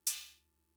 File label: Cymbal, Fricke MFB-522, Drum Machines